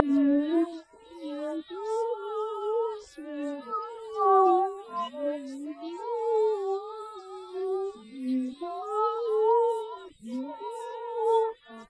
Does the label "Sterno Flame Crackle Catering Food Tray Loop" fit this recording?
No